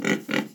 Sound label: Pig Grunt